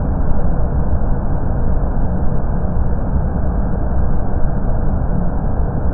NOSE GEAR AERO, Aircraft A320

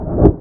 APU Door Close, F-18

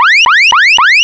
gyro power horn, Aircraft, Beech 1900

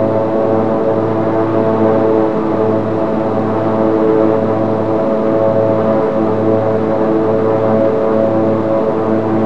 Engine Prop Full Pitch, Aircraft, Beech 1900

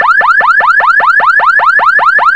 Overspeed Warning, Aircraft, Beech 1900

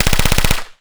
mac10

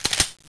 p228 slide pull, Weapons

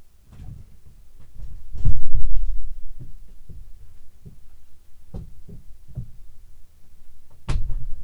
opening left cabin door, A320 - 321 Aircraft

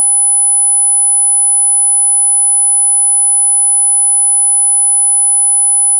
ac power, Aircraft A320

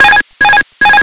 ap disco

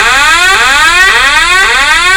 APU Fire Horn, Aircraft, B777

